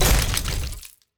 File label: Box Break; TANK; 3Souls